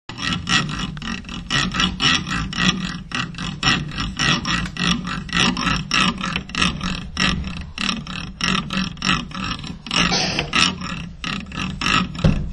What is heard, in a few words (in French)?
scie